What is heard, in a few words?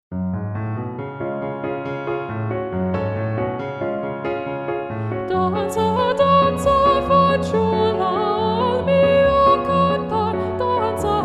danza danza, f min, chorus